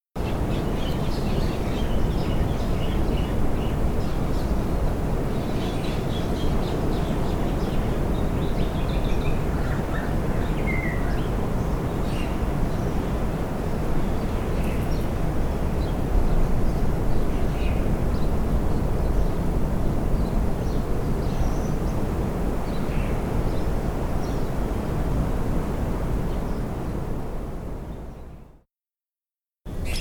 Kauai Nature Sounds (birds ocean rain bells gong); snowflake

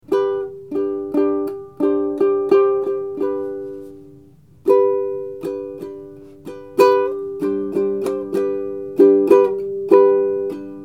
Uke Slow Strum

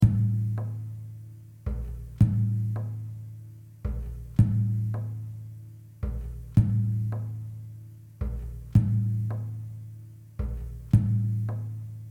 D110 GTR Impro

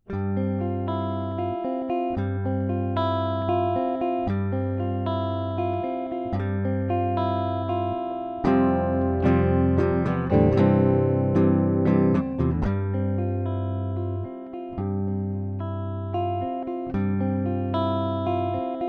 Snow riffs

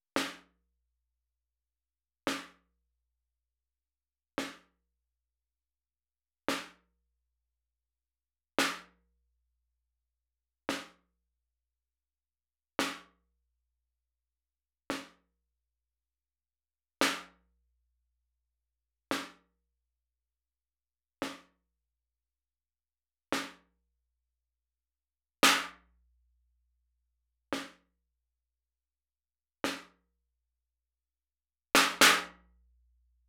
114 Fife N drum Snare

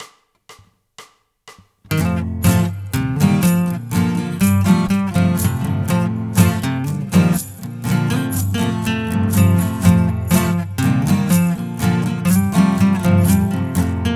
little guitar sample

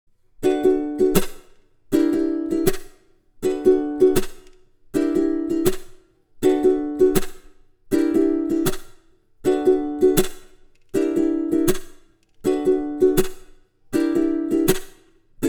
Ukulele Parts; Rise to New Heights

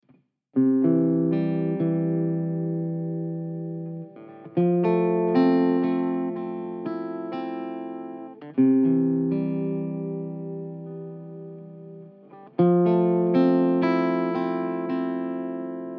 C 120 GTR impro chill groove

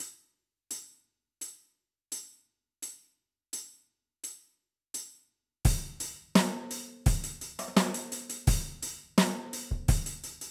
Drums

